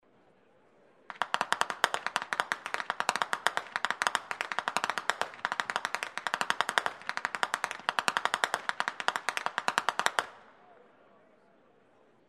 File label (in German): schnalzen; rupertigau